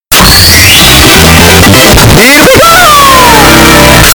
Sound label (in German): musik stern erhalt fanfare; super mario 64